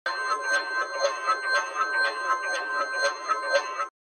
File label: Loop